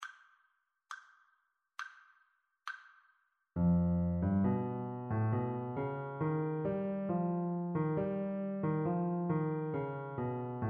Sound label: melodic dictation; ear training